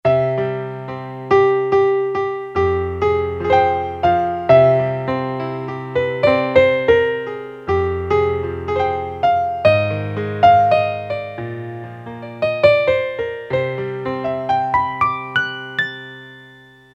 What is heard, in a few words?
PIANO, Yamaha PSR-300; Voices